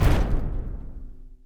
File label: block Explode; explosions; Mindustry